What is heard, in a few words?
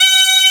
Bagpipe Bb3